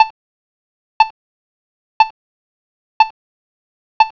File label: Locate Tone At 1 Second; accessible pedestrian signals